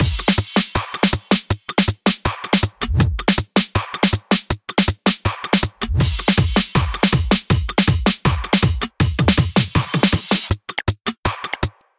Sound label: Buildup Drums 160 BPM; Future Bass; Cymatics; Future Bass Starter Pack